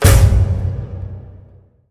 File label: successful hit; Minecraft; Conquest Default